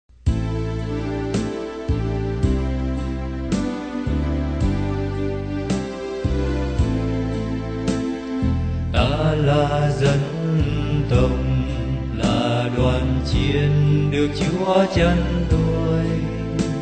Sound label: ALTO